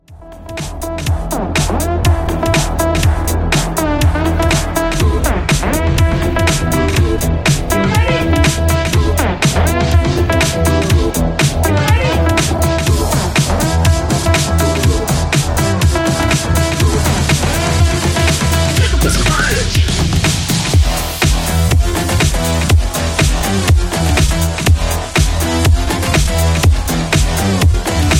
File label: Electronic House, 120Bpm